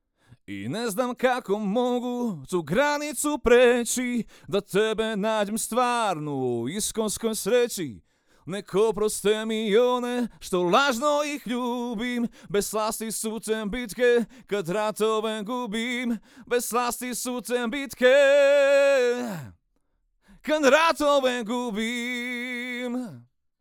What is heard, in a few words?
AKG Cardio; Reaper project